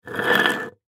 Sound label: Can Food Scrape, Foley Tin Can Empty Scrape Cement Surface; Scrape Sound Effects; Objects; Blastwave